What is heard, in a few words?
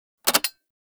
Mech Release, Weapons; Tenno; AK Bolto Prime